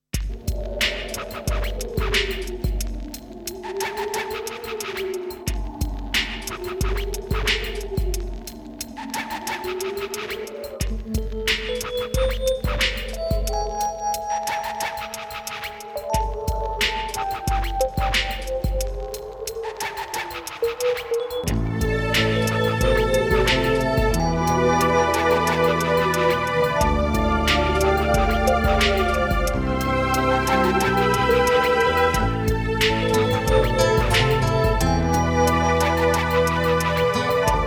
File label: spanish trip hop